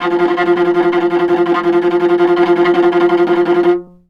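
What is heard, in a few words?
VIOLA tremolo F2; Orchestral; DirectWave; Varazdin Orchestral; Viola II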